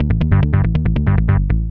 SNTH BASS ELCTR 140 A, Techno

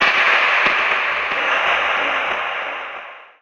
TECHNO FX 140

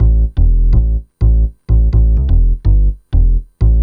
DEEPHOUSE BASS 125 A

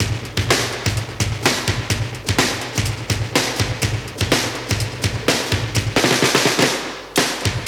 125 Classic Breaks, 125

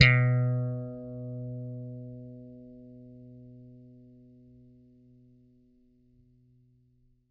GOOD 80 PLUCK B2, bass; Bass Mace; DirectWave

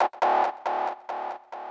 KEY SHOT TEKNO 140 A; Techno2; Singleshots

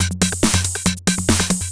hard beats 140, hard trance beats

